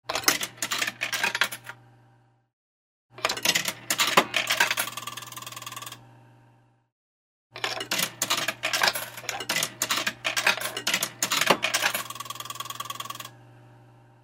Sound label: Throwing coins into the machine, falling out of goods